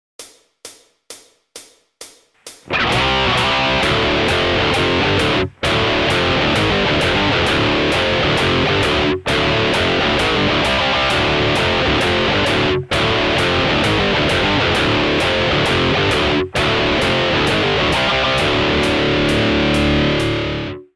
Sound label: power chord 5